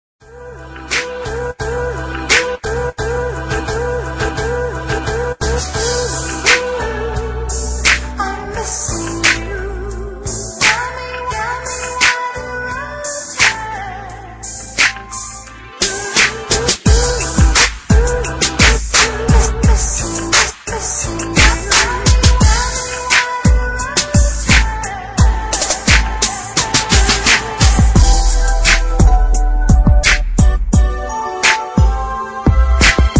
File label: hip hop beat w hook; fl studio